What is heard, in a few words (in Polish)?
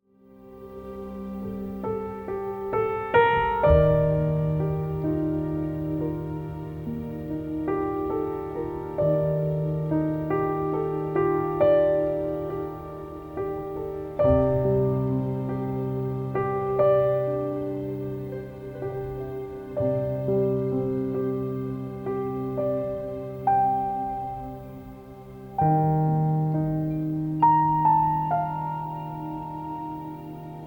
Zywiol Ziemia Instrumental